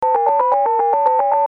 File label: slow speed dial; sound ideas